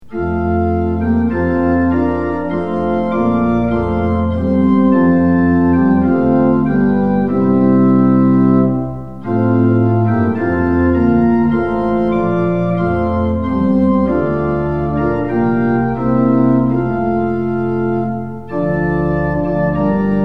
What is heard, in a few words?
tune; hymns; lillenas